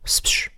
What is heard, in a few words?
spsh